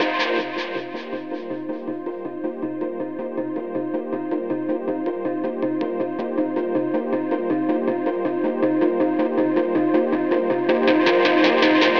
AMBNT 160; eJay; Ambient

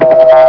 WIBBLE; Spanish Net; CAFE

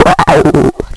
die; Mr. Cat's Quest 3

